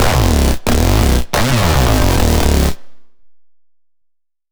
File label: Blank neuro, bass